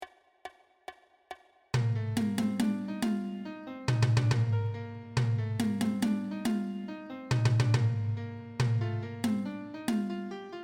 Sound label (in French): bombarde cornemuse percussion; quimper 2009